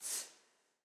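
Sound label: choir "C", women; Sound & Vision - Gigapack I CD 2 (Roland)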